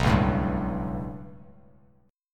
B 7th Suspended 2nd Sharp 5th down Bass Standard, chord; B7sus2#5 strummed; JGuitar